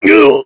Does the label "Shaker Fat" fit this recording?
No